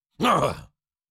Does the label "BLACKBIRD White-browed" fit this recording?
No